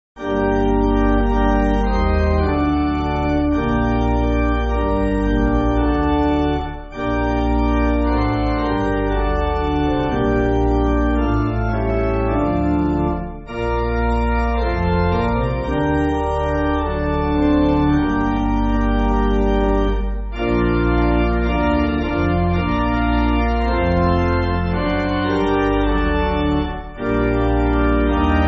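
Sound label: Pipe, Pipe Organ; St. John (Welsh) 8.8.6.D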